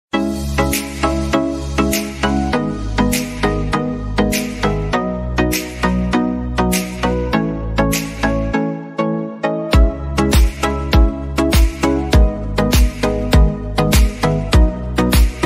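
arabic ringtones arabic song ringtone arabic background music arabic tone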